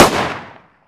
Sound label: fire, weapons; cw makarov